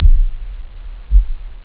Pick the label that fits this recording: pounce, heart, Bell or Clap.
heart